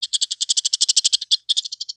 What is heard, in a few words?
Pets Skunk Ecstatic; creatures; Lego